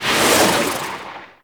Pod Shotgun Proj By

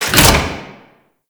Infested Metal Clunk; Warframe; Corpus

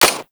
Fire Mech, Weapons; Lato Redux

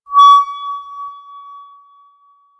Mall Hub Stage Feedback, Ambience; Warframe; Nineteen Ninety Nine

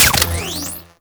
Shotgun Mech, Weapons; Warframe; Corpus

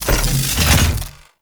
Railjack Electrical Hazard Out Of Service Open; Warframe; Vehicles; RailJack Hazards

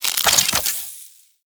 Warfan Equip, Weapons Melee; Warframe; Infested Warfan

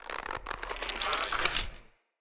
Hunter Bow Draw; Weapons; Enemy; Bows

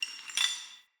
Cave Ocean Rock Crumble

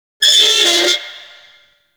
Forest Metal Squeak Rand; Warframe; Grineer

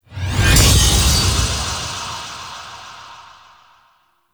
Sword Assembly Pulse; Gameplay; Warframe; Chimera Prologue

